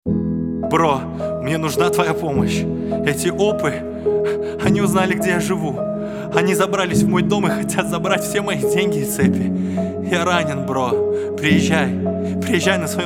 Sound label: Piano Version